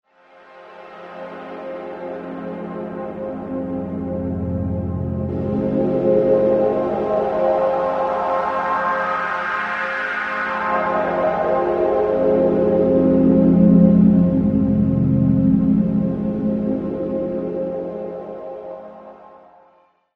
synthex bp strings

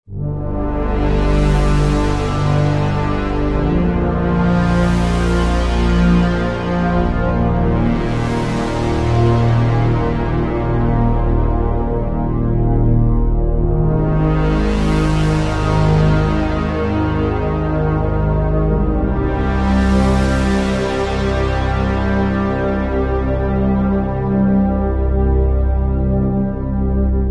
Virus KB

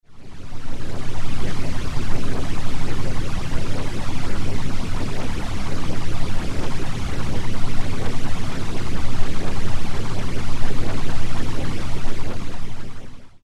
Eminent 310 noise

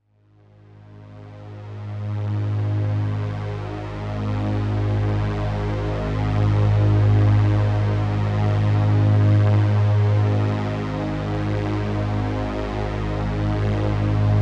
synthex chrono1 strings

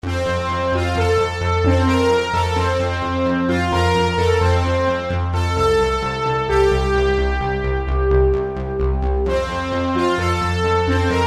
synthex rv4 brass

